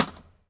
Fish Creek Trestle Bridge, Impulse Response, Underpasses; EchoThief Impulse Response Library